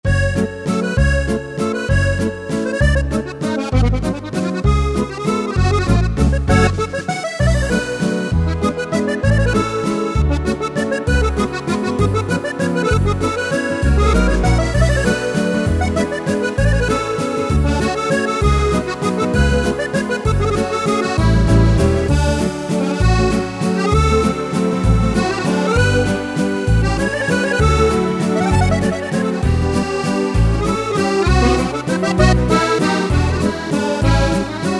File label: French Waltz, Yamaha PSR-3000; Factory Demos